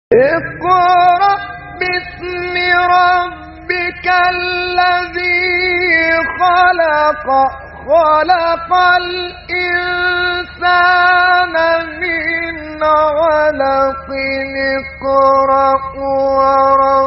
فراز زیبای از سوره مبارکه علق; محمد عبدالرحمن الخولی

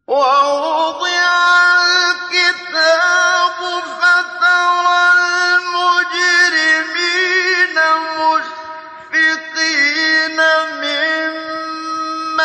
تلاوت آیه سوره کهف; آیه 49; استاد منشاوی

